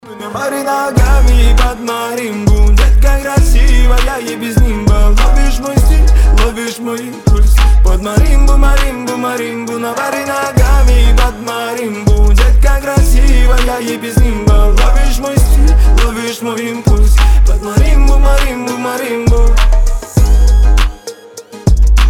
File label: pod marimbu